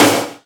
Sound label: Snare